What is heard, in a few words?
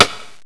clack